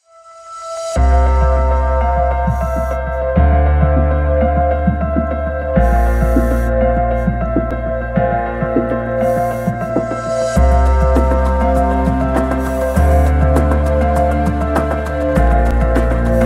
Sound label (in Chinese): 欢快的背景音乐